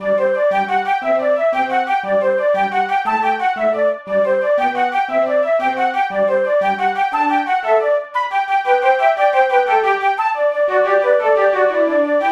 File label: Flute; The Bard's Tale Remastered Trilogy